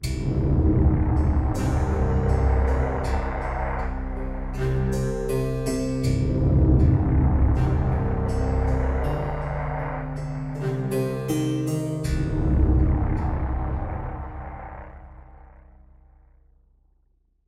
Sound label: Music stinger